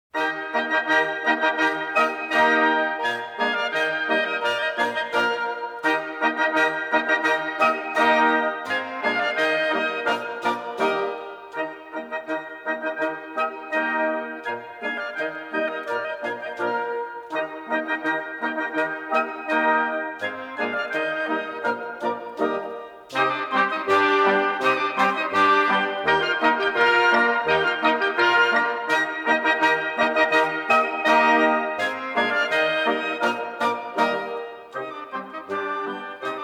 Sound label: medieval march